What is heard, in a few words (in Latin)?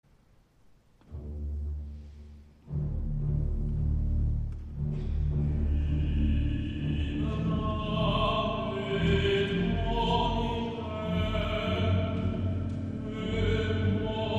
Requiem Libera Me SOLO